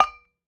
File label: Ball Collision Holiday Mug Soft